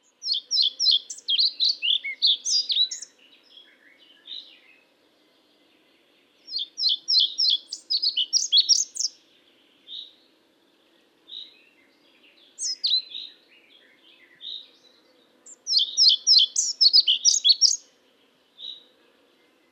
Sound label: ficedula hypoleuca; life dviete